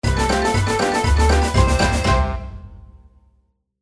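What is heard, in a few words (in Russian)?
быстрый темп и счастливый звук перехода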